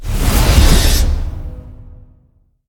activate; smite; chronos

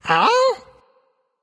nv confused; cupid

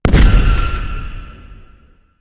aoe; smite; thanatos

